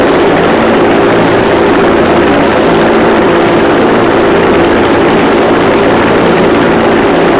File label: engine ai bomber rpm 50 mid; War Thunder; engines